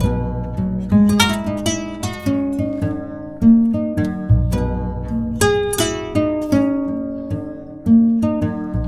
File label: KORA